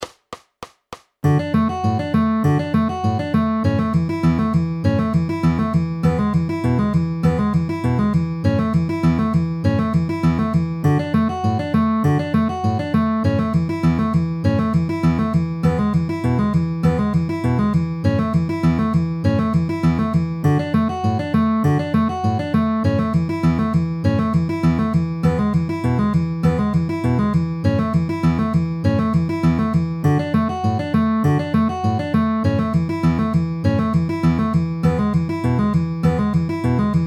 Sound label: D A E A downward arpeggio middle ring with alternating bass at 200 bpm